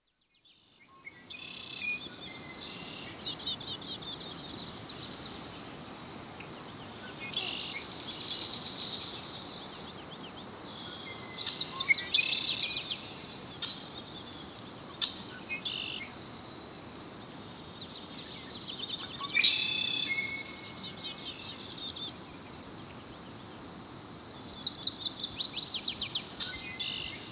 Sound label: Near a Small Creek In the Forest; Dr. Sound Effects; 210 Nature Sounds - 20 Hours of Relaxing Natural Ambiences for Meditation and Sleep